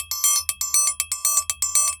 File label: TRIANGLE, DRUMS SAMBA; Best Service ProSamples vol.14; AKAI